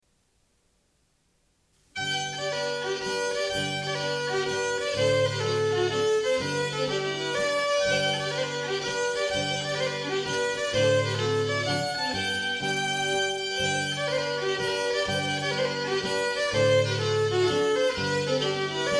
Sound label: Polska